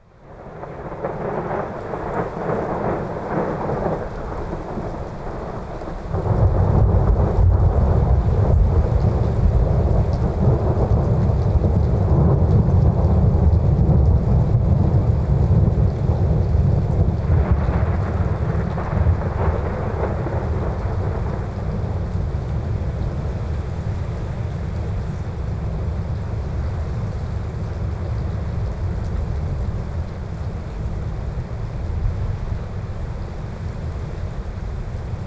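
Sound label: ambience Storm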